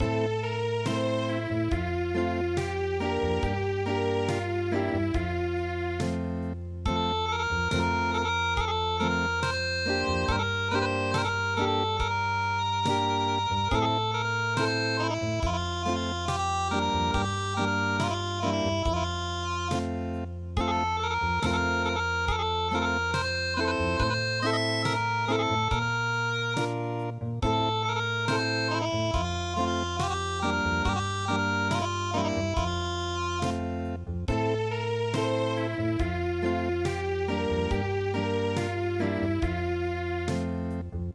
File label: Acoustic; Hagoromo no komoriuta